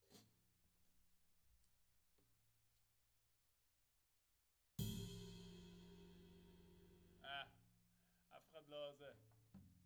Tom

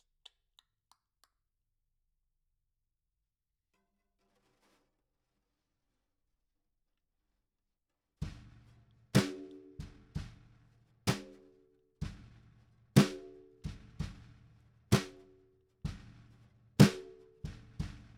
SNR Top

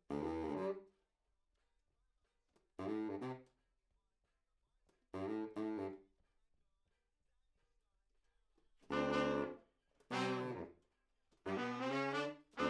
Tromb

